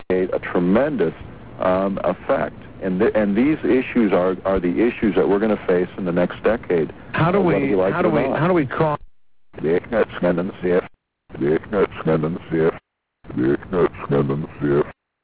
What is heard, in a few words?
harp